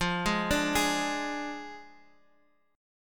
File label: D Diminished, guitar chord